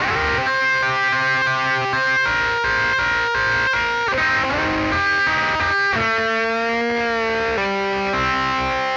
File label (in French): guitare solo